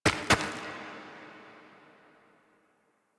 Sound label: Foley Steps Door Slam; Cinematics; Operator; Warframe; The War Within; Mountain Pass